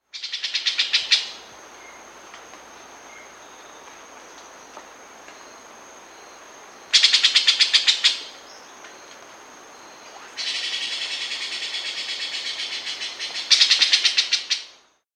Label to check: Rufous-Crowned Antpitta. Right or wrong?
Right